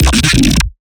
Riddim Bass Hit; Scronch

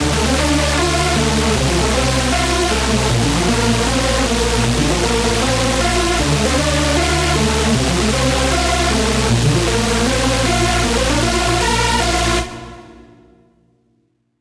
lead loop Dmin 155; Music Loops; GhostHack

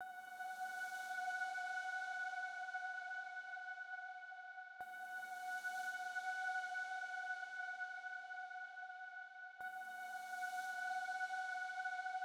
100 BPM D# Min Accent, Pop; Cymatics; GHOST (Beta Pack); Broken Heart

